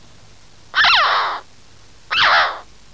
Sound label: Pyrrhula pyrrhula